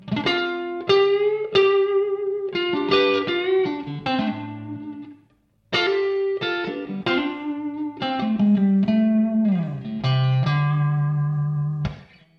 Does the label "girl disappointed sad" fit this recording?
No